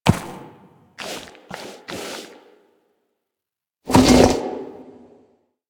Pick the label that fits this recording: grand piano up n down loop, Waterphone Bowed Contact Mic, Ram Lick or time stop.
Ram Lick